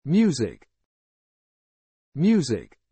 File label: music